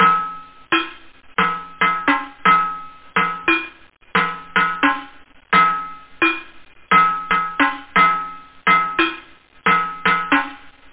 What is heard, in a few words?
bell